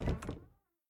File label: Hiding Spot Exit; The Town